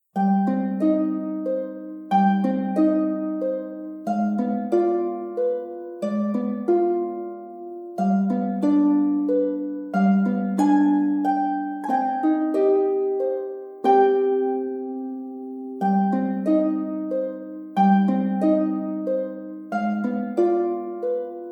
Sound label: Little Harp Pieces